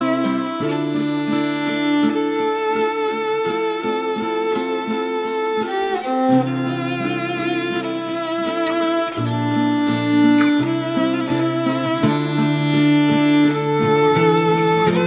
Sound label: Folk Style Violin Cover